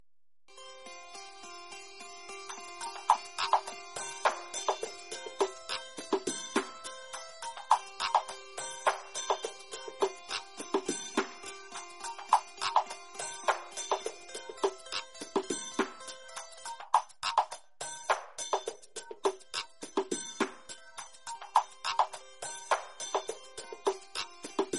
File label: japanese koto, ringtone